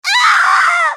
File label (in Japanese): ファイター女･死亡